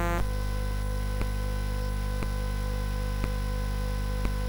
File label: Dark Castle, CSG8580R5